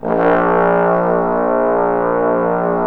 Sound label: BRS BSTBN, Bs.Bone Sect; Roland L-CDX-03 Disk 2; Bs.Trombones